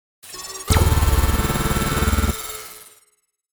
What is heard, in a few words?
Laser Gun Long Blast Futuristic